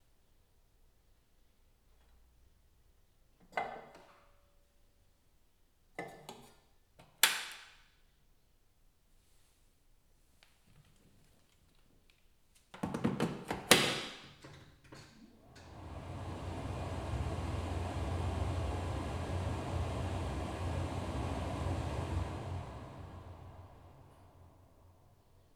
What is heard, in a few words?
Plugging In An Electric Car